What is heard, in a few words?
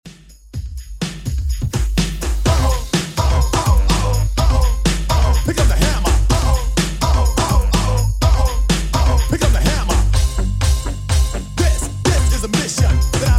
2ks Redrum 125bpm